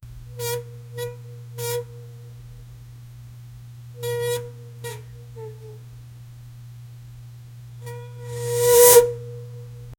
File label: the sound of the bowstrin, weaponry